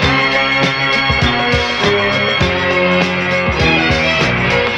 horns

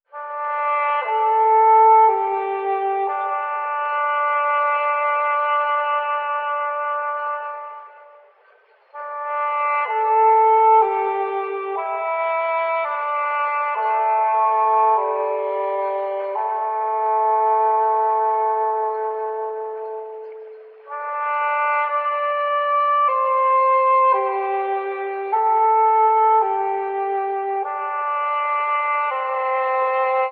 sax, Sad

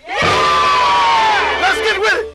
yeah crowd let's get with it; ooh ahh

